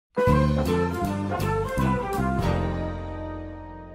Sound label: transition; family guy